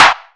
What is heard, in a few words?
short hand clap sound c key, Royality free clap sound clip tuned to the C note; single hits one shots